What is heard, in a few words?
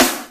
DR550 Snare, Boss DR-550 Drum Machines; 300 Drum Machines